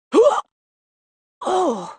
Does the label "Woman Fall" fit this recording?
Yes